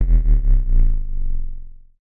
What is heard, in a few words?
Energy Hum Down; Sci-Fi; Chris Logsdon; Sci-Fi SFX Pack